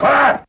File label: "ha", Voice